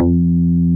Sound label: BASS C2; Kid Nepro; Elite